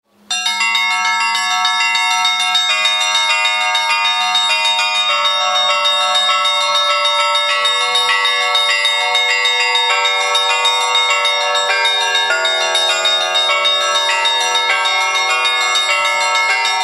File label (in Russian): zvon